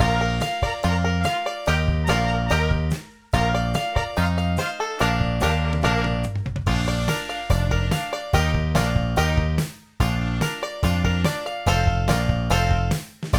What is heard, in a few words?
Bb Jumping Beans 144